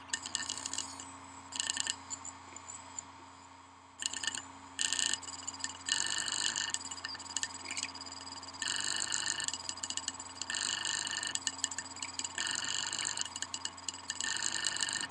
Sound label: hd sounds, laptop, bad; mac disasters